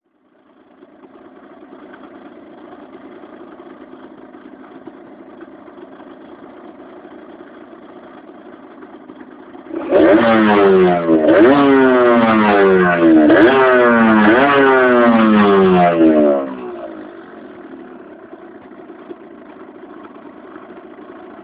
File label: exhaust note; cathous